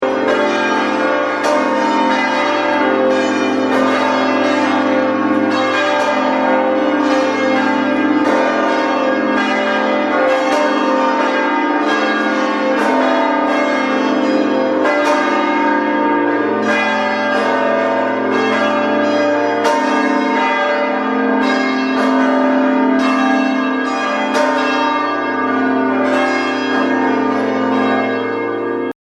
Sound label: abbey ringtone